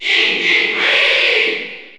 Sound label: Cheer French; SSBU